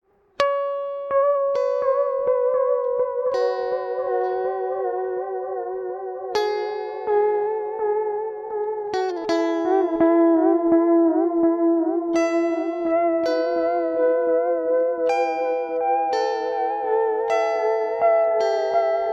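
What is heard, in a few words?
Guitar Tape; ReTracer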